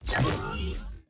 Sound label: equip energy, weapons; cstrike; valorant; singurality phantom